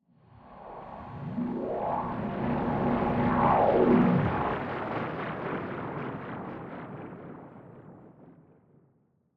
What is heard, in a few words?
Sci Fi Movement Long; L.A. Sounds